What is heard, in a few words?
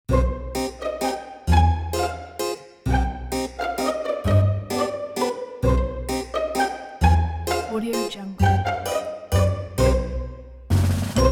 halloween spooky fun